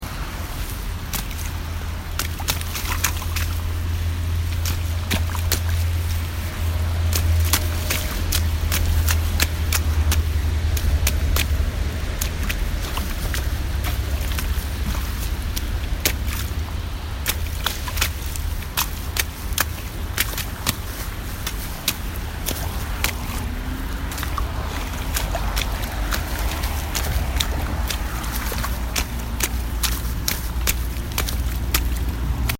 Splashing In A Puddle

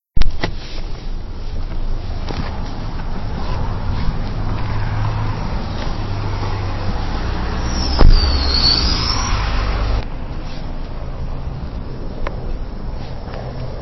Morning Walk, Field Recording; Location: Parking lot outside Lowe; car door

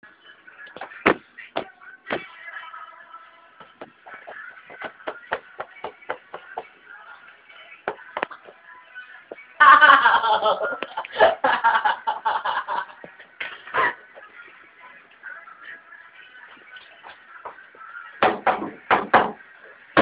laugh; Nassau Hall

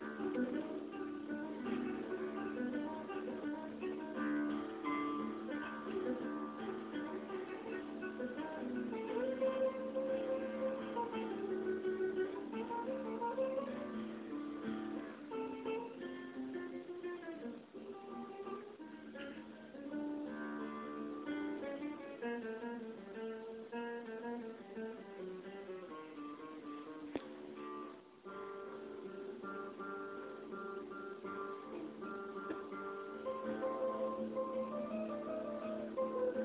GUITAR

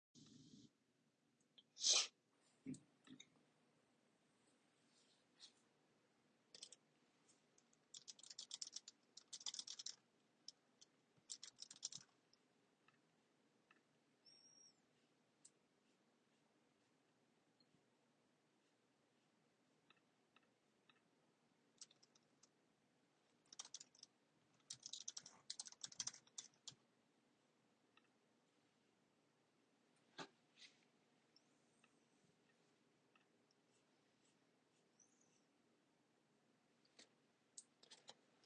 Ceramic studio

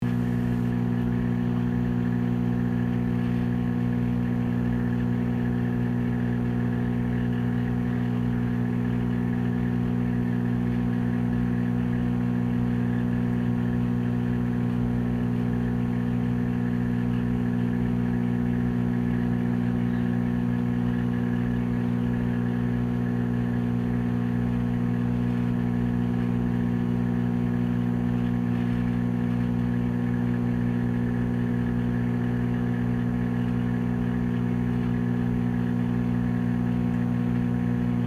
Microwave

